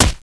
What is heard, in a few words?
fist hit